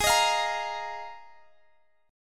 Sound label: Bb 7th Suspended 4th Ab, chord